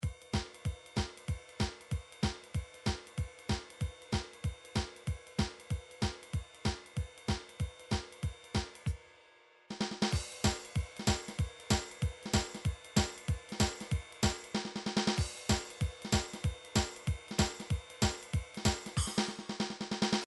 drum midi files rock

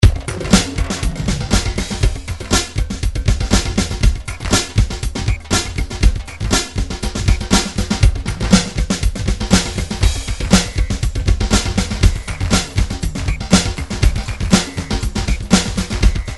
drum grooves midi loops rap; Steinberg Nice Beats 1000

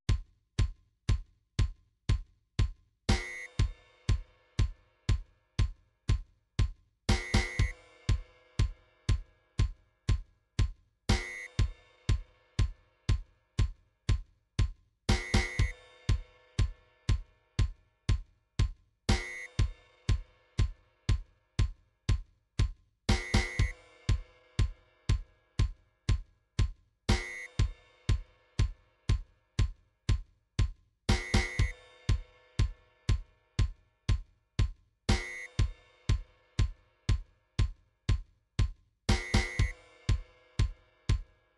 drums, midi drum patterns; midi drum patterns vol 1 to vol 4

